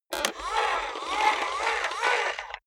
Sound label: robot movement slow